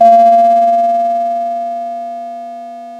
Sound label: pop, Sound of a doorbell